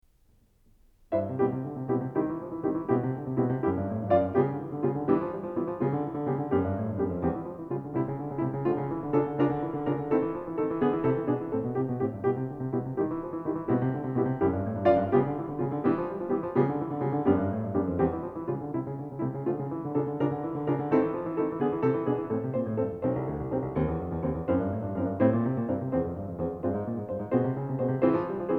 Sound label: 33 Piano Variations in C; Anton Diabelli